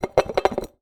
Base Wobble, Medium Wine Glass

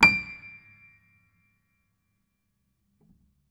Note High, Piano; Piano Miscellaneous

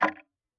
Tick, Clocks Kitchen Clock

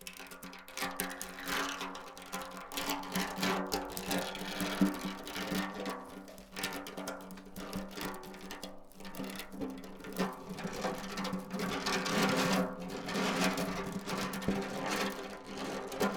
Snare Drum; Percussion Samples

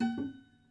Prepared Piano

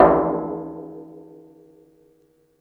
Oil Drum

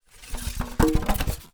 Polystyrene Rattle

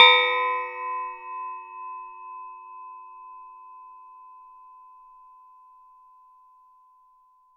Metal Teapot Stand Strike

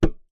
Key Noise, Melodica

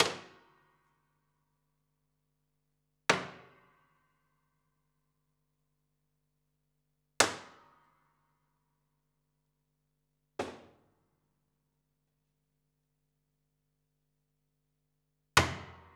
Body Thump, Piano Destruction

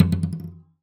Cello D Bass

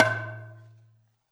Gambang Hard Beater, Gamelan

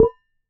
Damped Hit, Glass, Large Wine Glass

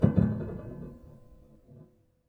String Rub Erratic, Piano; Piano Miscellaneous

